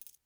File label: tab, btn sound